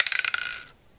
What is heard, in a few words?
cartoon